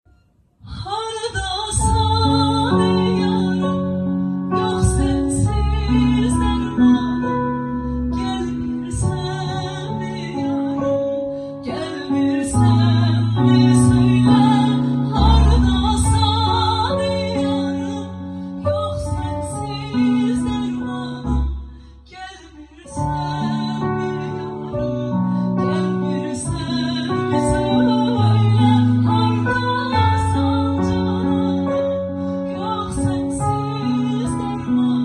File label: Guitar